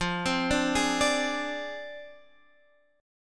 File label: F Minor 6th, Guitar, Standard; Fm6 arpeggiated; 13,15,12,13,15,x; JGuitar